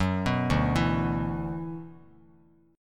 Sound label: D 6th Add 9th D, Bass Standard; D6add9 arpeggiated; 14,14,0,9; JGuitar